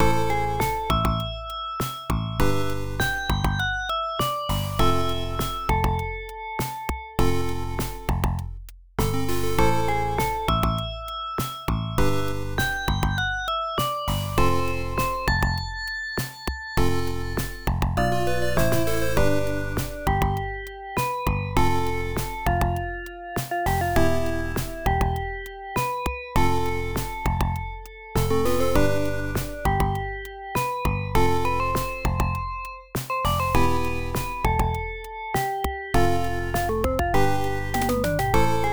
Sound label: Drama (Start); Adventure Tsukuru 98; PC-98